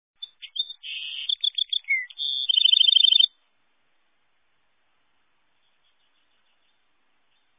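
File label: Kanarejka, canary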